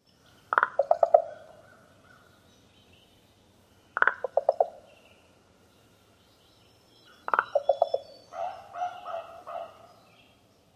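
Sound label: Common Raven popping calls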